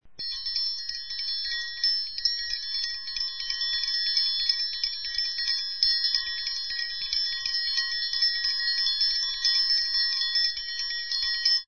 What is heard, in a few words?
TRIANG